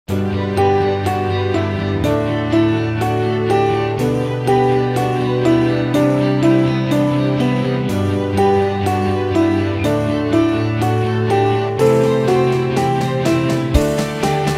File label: Cchime C